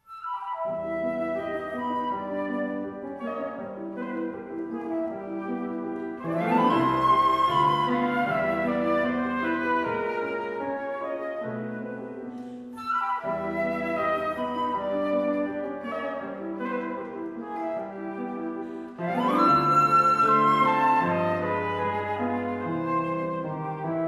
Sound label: Allegretto Malincolico, Flute Sonata; Poulenc